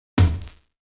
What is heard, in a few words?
battery midtom; Multitrack; Stem Kit